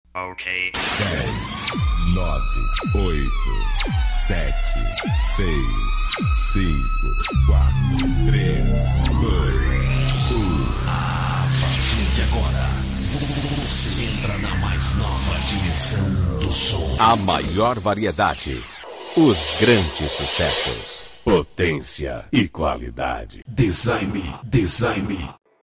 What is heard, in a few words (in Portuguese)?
abertura